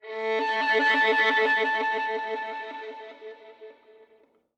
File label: Violin Octave Flutters